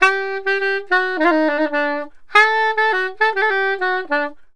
SAX, Balkanish Traditions

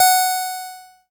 ELKA, String Machines; ELKA RHAP

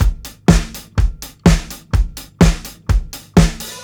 125 4 FLOOR SNARE, DRUMS LOOPS; BASEMENT DRUMS VOL 2

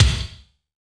BD, BASS DRUMS; Drum Mashines; BASS DRUMS 2; EdgeSounds

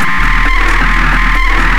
FXBEAT

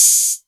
808 Hat O; Novation Drumstation

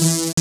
SYN, DANCE SYNTH

